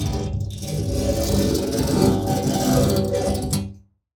Wire Brush Glass

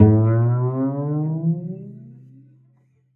Cello D Bass

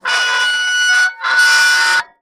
Soldering Iron Stand Drag

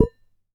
Damped Hit, Glass, Large Wine Glass

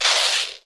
Drag On Floor, Medium Wine Glass

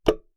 Key Noise, Melodica

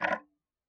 Tick, Clocks Kitchen Clock

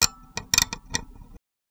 Violin Extended Technique

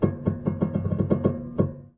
String Rub Erratic, Piano; Piano Miscellaneous

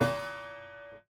Prepared Piano

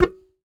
Very Short Note, Melodica

